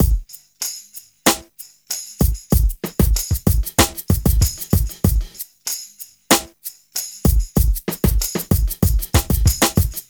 Smooth, R&B Drums

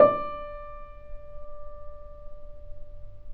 PP D 6 off, Grand Piano off mic; AKAI S6000 CD-ROM - Volume 2

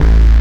bass techno; Ueberschall; Techno Trance Essentials

